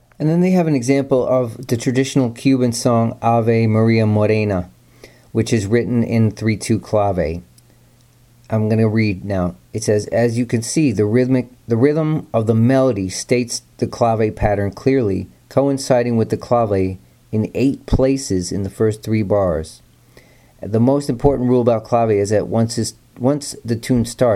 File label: latin jazz, clave, Afro Cuban